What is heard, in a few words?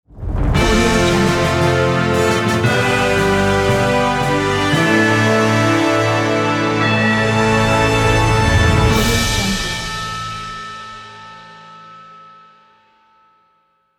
win fanfare logo